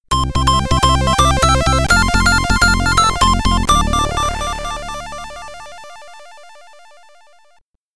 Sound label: Get Ready! (SID Stereo)